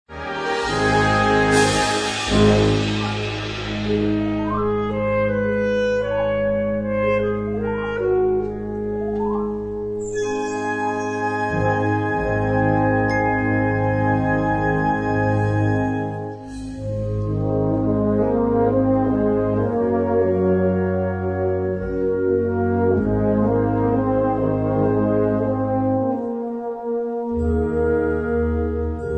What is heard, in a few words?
drones